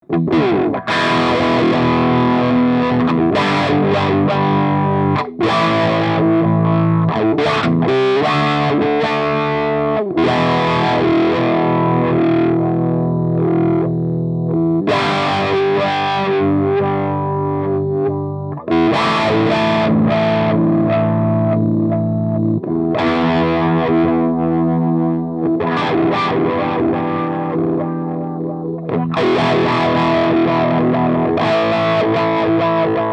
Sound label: Wah Chords